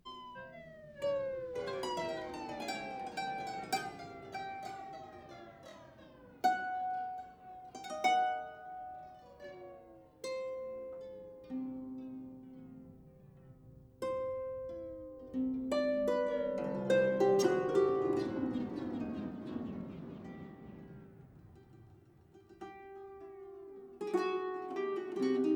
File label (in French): harpe malade expurgee